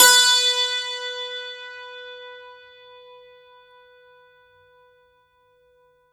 STR, SANTOOR

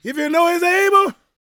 GOSPEL MALE; Spectrasonics Vocal Planet

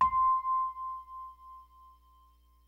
DYNO RHODES, E-PIANOS; Kid Nepro - Elite

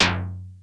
GOMOBUK HIT, FM PERCS; Crypton - DrumBase5000

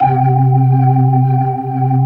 C3, Keyboards Old School; USB Soundscan vol.09; AKAI

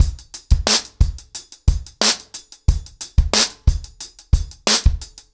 ACOUSTIC DRUMS, 90 BPM

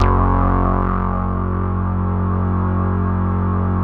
CS-15, BASSES

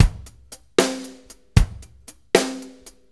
6-8 Loop, Full Kit Loops; Drum Tools

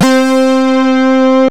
Synths BASS

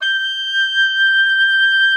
OBOE G6; Xsample VOL-6

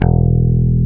E1 PICK BASS

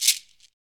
SHAKER, Afro Shakers, Shake&Rattle; Roland LCDP14 Africa VOL-2

